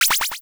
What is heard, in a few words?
popup incoming; yumpod